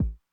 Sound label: Kick Mid Tone Min Attack Mid Decay, 909; classic machines